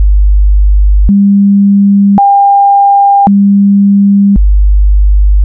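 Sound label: sin, vocoder carrier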